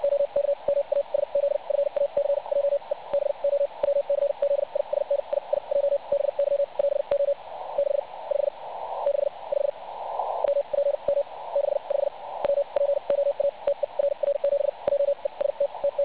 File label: websdr recording 3564.8kHz